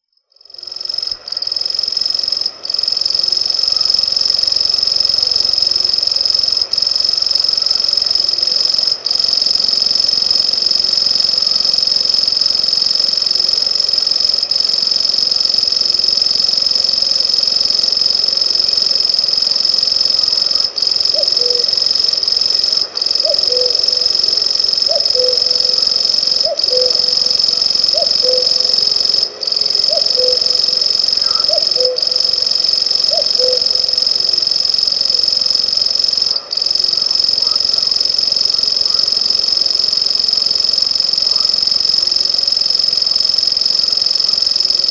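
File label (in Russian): obyknovennyy sverchok golos, grasshopper warbler voice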